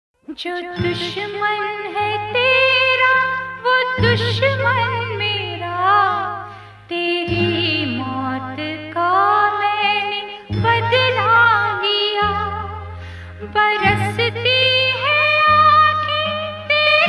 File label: (Sad)